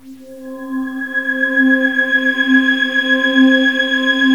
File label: Goblin, Synth SFX; GMTones